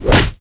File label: Attack